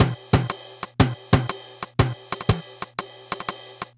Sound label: Loop, dd-5